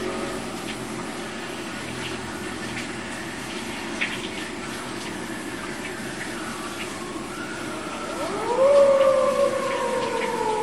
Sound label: bath siren; kyukyu big